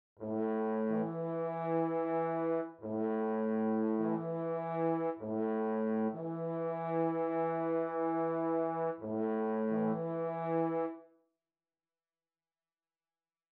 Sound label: French Horns